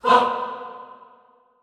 HAH B2, Classical Choir, SHORT HAH; Best Service

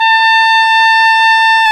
oboe; Roland LCDP04 Orchestral Winds; Oboe Brth 1

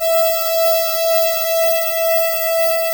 SPACE E4, CLAVI

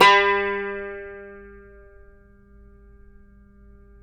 CUMBUS, OUD; Roland - LA Composer vol. 3

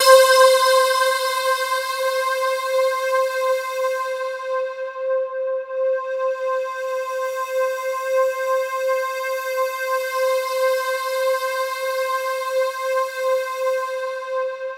C4, SynthLine; 90 s Sample CDs; NiceBeats; SynthLine VOL-11

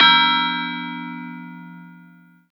Bell, One-Shots; Rondo Brothers Trip-Hop Loops & Samples; Until We All Fall Down

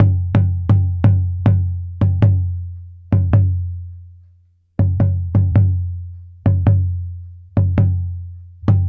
Tambora, African

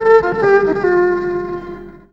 EVIOLIN, ELECT VIOLIN

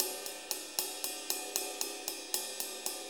6-8 Ride, HiHat & Ride Loops

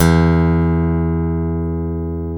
GUITAR; AKAI S-Series CD-ROM Sound Library VOL-7; A GUITAR 2

